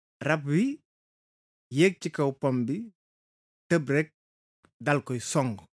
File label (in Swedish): bip